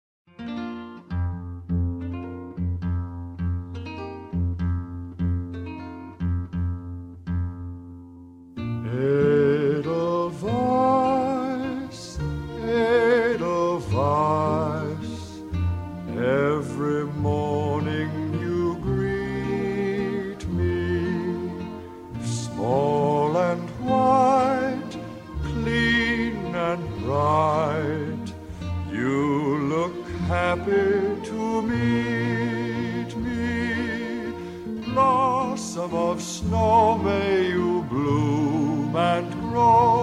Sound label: Vocal Guide